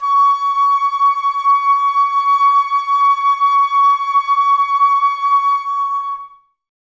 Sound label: Flute, Woodwind